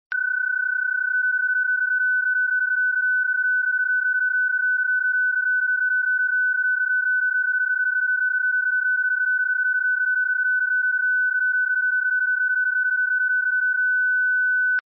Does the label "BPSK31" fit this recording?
Yes